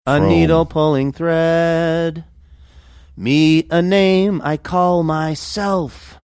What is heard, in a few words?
singing a needle pulling thread